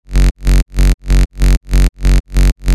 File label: Mega Tek Bass F